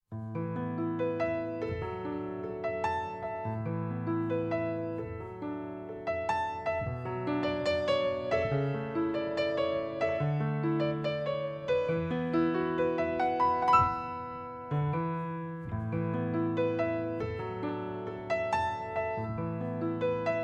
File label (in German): Psalm A Dur